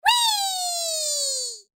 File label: voice laugh; Fruity Burst 2